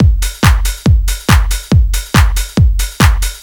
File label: Techno Drums; Techno Music Maker